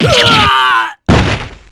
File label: yuwaaah